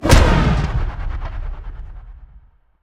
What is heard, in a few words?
shout whirlwind